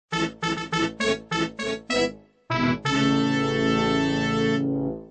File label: race fanfare